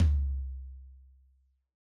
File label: Floor Tom L, Yamaha DJX 2B